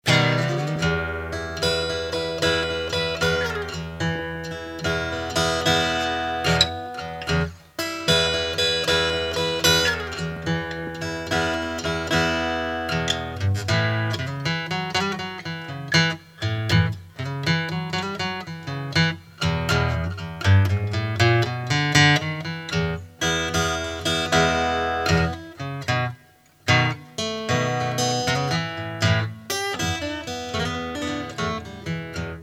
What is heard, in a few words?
texas guitar blues